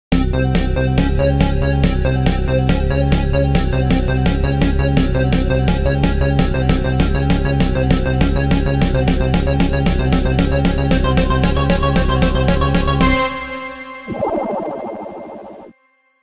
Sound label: Drowning Theme and Game Over Theme Remix; Sonic 1